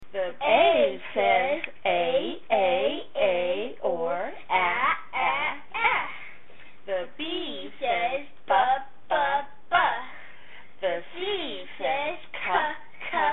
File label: first grade letter chant